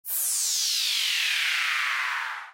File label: extra win; Fusion Fruit Beat